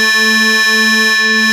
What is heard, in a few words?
OSCAR A3, synthesizer; Hollywood Edge - Giorgio Moroder Rare Synthesizer Collection